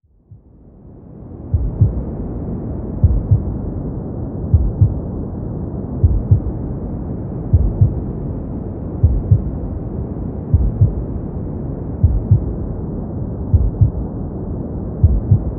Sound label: Womb Sounds with Gentle White noise